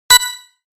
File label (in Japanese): ピッ音